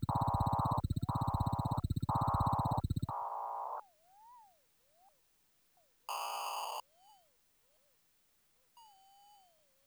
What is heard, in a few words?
030bpm; elektron machinedrum